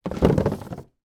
household hand run accross several dvd cases in wooden rack